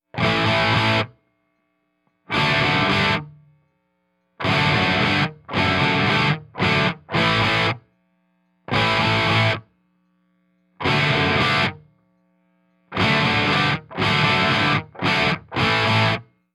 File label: classic rock